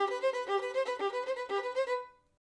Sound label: violin